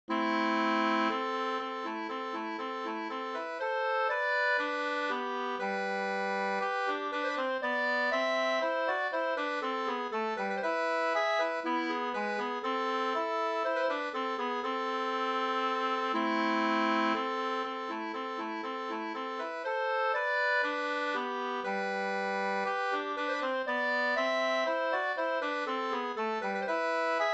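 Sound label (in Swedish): brudmarsch Dalmarsch Uppland 2 stämmor; Marstalla-Olle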